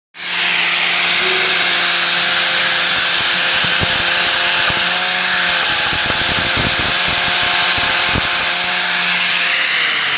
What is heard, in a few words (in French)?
Meuleuse